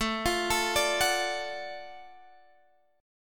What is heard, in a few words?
Gb Minor Major 7th Sharp 5th, chord